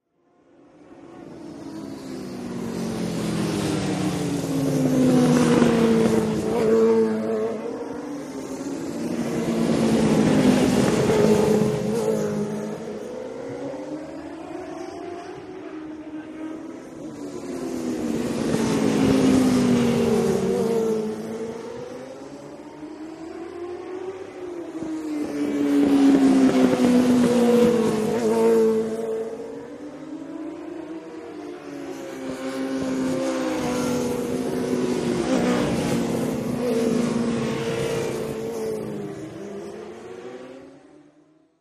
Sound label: Motorcycle Road Racing